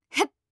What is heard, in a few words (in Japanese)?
攻撃